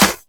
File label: Claps & Snares